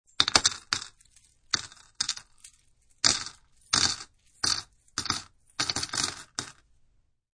Coins Bowl, Multiple coins being dropped into a wooden bowl.; Clanks; Objects